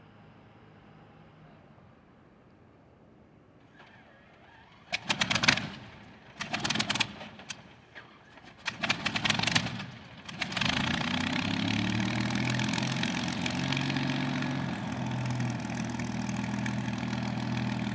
Bf 109 Startup

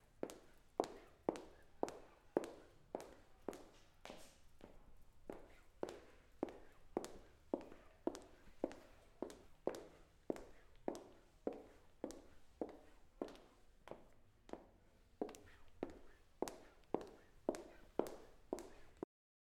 Girl Walking In Heels

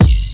Kick

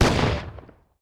Shotgun

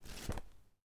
Book Close

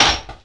Metal Drop

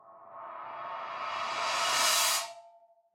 Cymbal Roll

